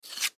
Cards Scrape Poker, Cards Scrape Poker Playing; Casinos

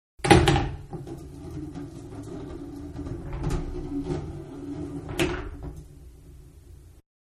Billiards-Ball-One Entering Into Pocket; Pool (Sound Effects:Sports); Manhattan Production Music

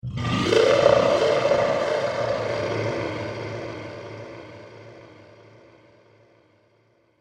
Scream Demon; Monster Sound Effects; horror sound effects

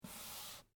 Bible Move Finger, On, Page, Book; Foley; Books; Offices; BlastWave

